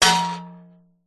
warfare sword strike metal could be shield vibration; zapsplat